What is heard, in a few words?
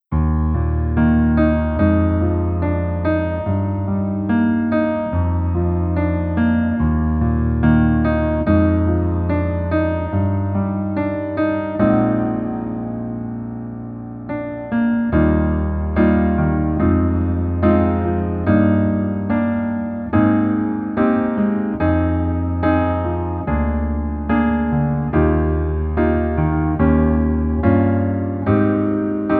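Piano version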